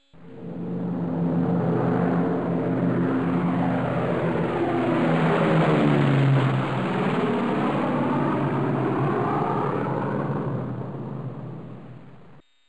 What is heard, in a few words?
Bombers Taking Off; BOMBER III™ (DEMO)